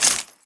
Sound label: foley